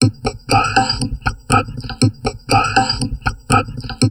TALK 120, Drum Loops Crazy Processed

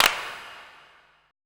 E CLAPS, ETH PERC

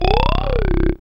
SYN, Roland; Sound & Vision - Gigapack II; Morpheus

